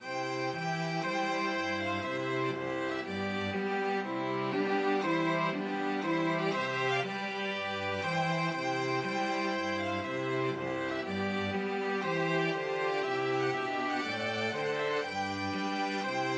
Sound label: tpt tune STRQ, string quartet; clarke